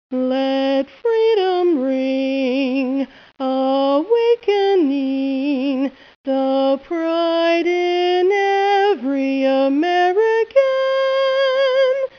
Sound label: freedom lead alone